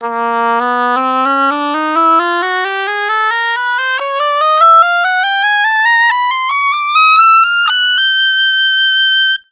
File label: oboe range